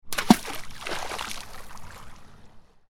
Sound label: net throw